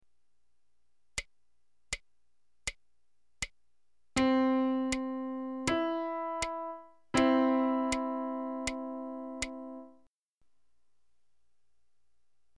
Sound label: interval